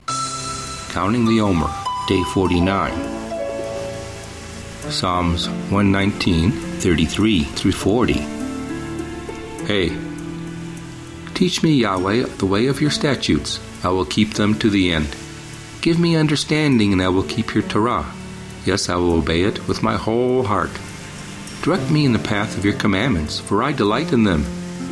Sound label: Meditative w Waves Ocean; Omer; Psa119